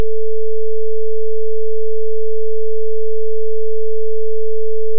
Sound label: 440hz offset